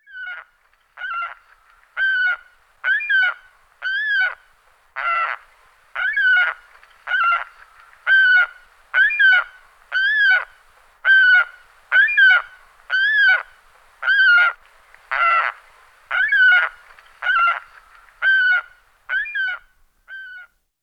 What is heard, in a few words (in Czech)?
Raroh velky Falco cherrug